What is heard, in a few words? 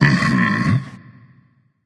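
non verbal agree, voice; cernunnos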